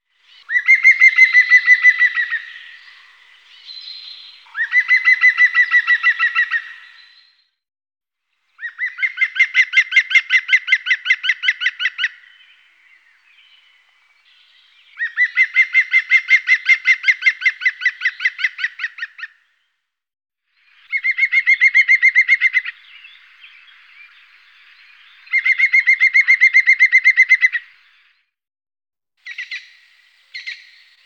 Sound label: green woodpecker